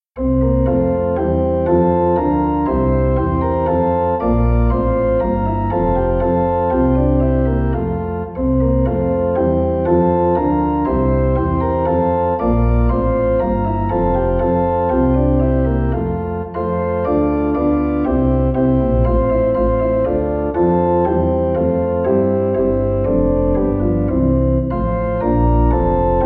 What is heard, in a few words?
Fughetta